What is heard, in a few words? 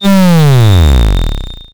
Sfx, Trance; Zero-G Trance Inducer; General Sfx